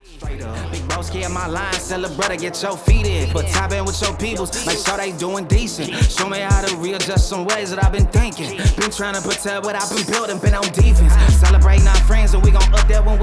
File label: Hip Hop Mix Dry